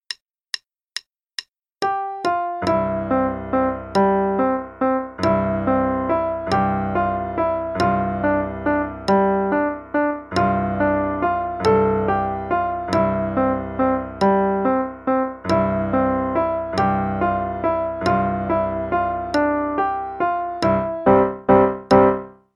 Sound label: Irish Washerwoman (both parts); Faber Adult Accerlated; Adult Accel Level 1